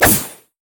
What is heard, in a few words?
basic attack; lux